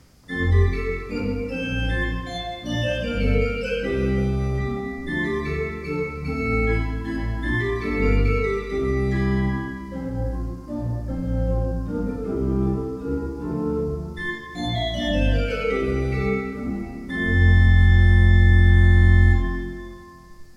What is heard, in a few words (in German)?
Walcker, gebrauchtorgel